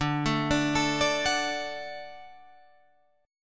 D Major D down Guitar Drop D

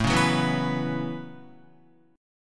A 6th Add 9th A down Guitar; A6add9; strummed

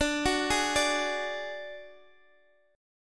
D-Major Flat 5th, D, Guitar, Drop D

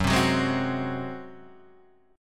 Gb Suspended 2nd Flat 5th, Guitar, Standard; strummed; 2,3,4,1,1,2

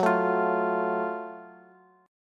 Gb Minor Major 7th Gb down Bass; strummed; JGuitar

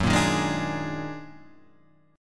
Eb Minor Major 11th

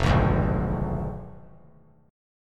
F Diminished 7th F down; Fdim7 strummed; JGuitar

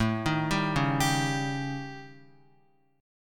A 7th Flat 5th, down, Guitar; A7b5 arpeggiated; JGuitar

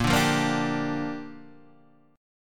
A sharp 6th, guitar chord; strummed; JGuitar

